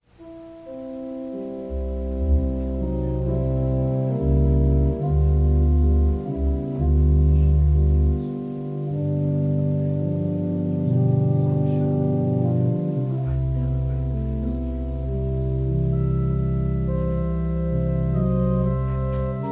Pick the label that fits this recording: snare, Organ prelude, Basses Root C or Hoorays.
Organ prelude